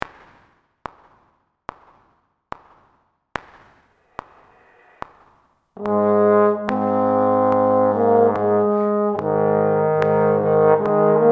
Maj A flat, trombone; The Tone Ladder

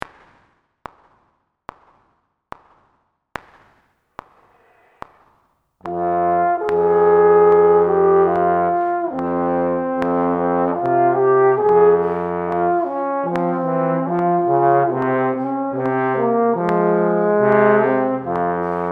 Min f, trombone; The Tone Ladder

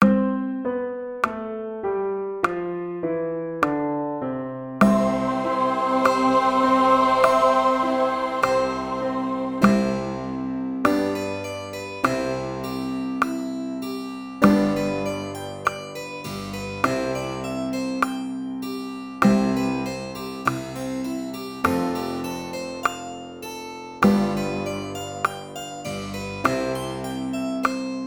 buzz tenor; tenor trombone; Air/Buzz; Tempo = 50; First Habits Warm-Up

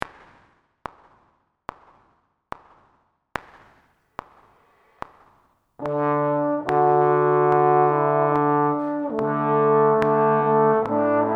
Maj D, trombone; The Tone Ladder

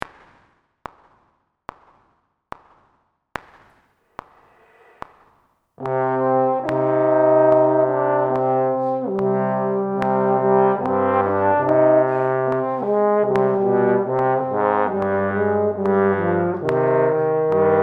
Min c, trombone; The Tone Ladder

